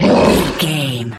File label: troll monster battle grunt